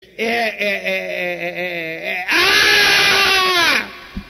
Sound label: e e e aaaaaaahhhhhh